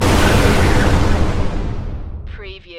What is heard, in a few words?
SYNTHESIZED EXPLOSION